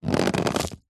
foley luggage leather hard case press down pressure creak